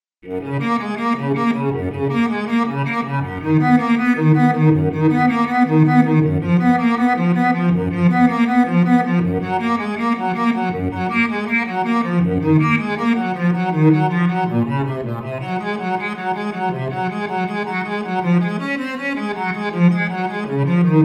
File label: Bach Cello Suite